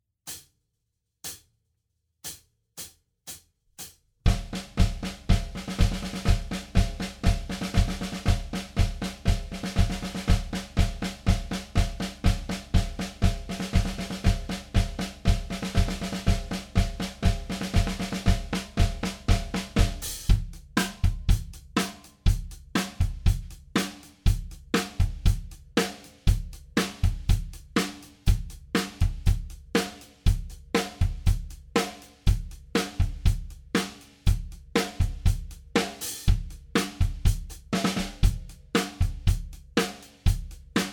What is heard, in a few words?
Drums Only; Rehearsal Audio Tracks